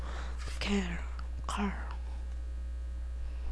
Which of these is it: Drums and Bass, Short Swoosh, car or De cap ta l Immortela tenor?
car